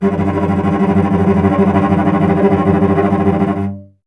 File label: STR VC G 1, Cello; Miroslav Vitous; Solo Instruments VOL-1